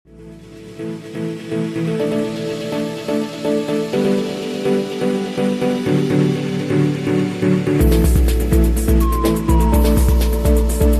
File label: Analogue Bubblebath